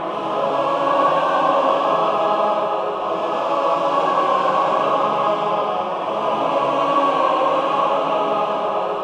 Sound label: ROUND, ROUNDS, Symphony of Voices